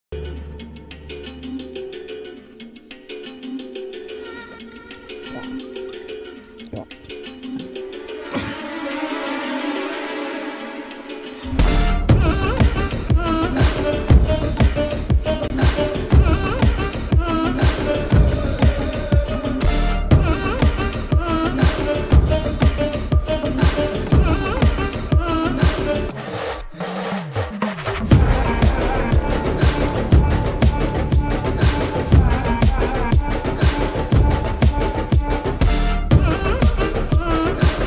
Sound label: end titles bgm, telugu; mangalavaaram